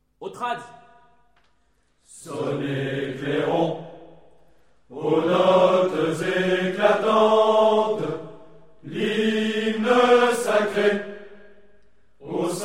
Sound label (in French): Hymne; trads